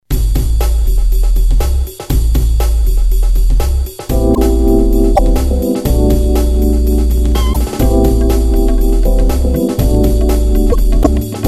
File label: karmic buzz